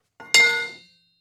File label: Falling Metal Piece